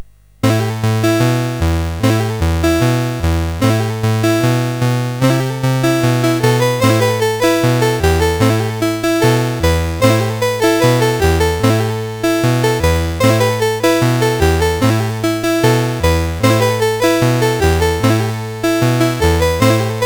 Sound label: Happy Birthday, CSG8580R5; Quantum Link